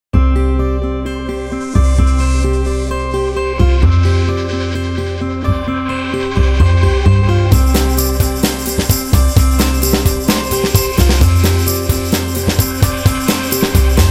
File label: Shuffle Step, Nokia Tones; Windows Phone apps